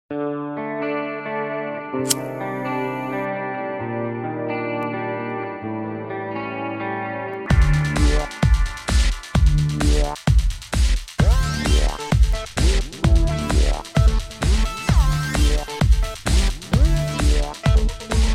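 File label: background music Ringtone; Don2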